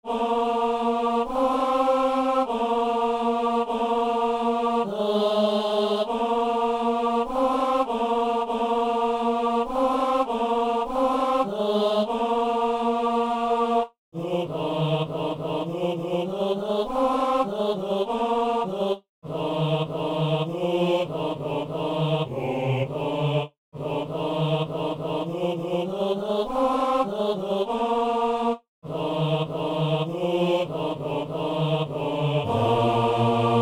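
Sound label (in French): ténor; al messe au dieu de la paix sanctus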